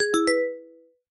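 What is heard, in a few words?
quiz sounds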